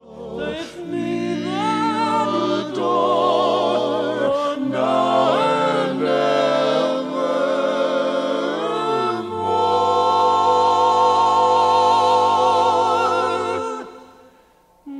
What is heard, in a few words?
barbershop tags, all parts